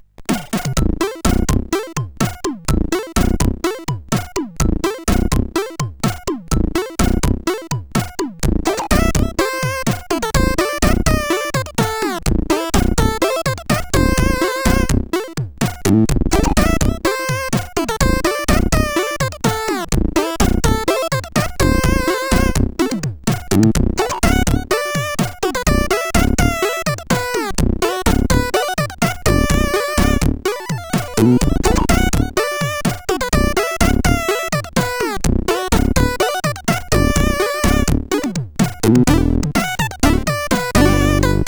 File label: Altered States Tune 1 sid CSG8580R5